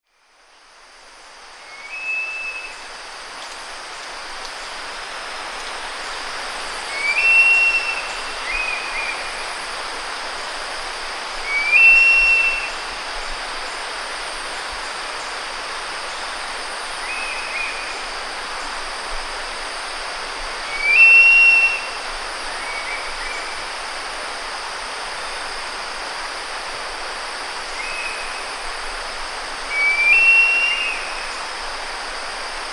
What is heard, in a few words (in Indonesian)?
elang jawa